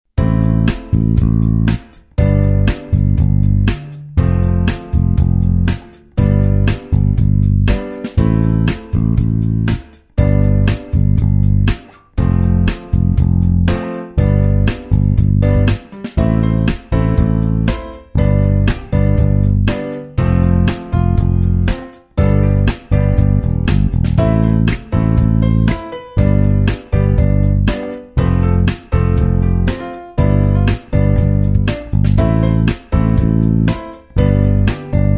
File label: Bass riff; lezioni; Bass Lessons